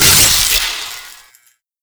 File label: blade shock; eblade; garrysmod